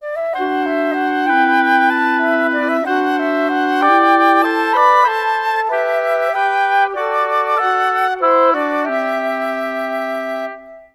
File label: 95 G maj, Woodwinds; PowerFX; Downtown Orchestra